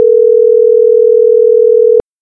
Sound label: Ringback, Door Phone; RBX